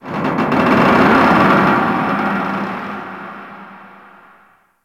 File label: Underwater Metal Creaks Large, Ocean; Warframe; Grineer Ocean